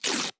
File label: Tearing Rustle